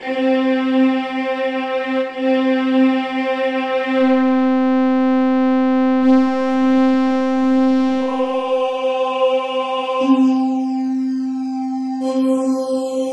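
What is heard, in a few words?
ensemble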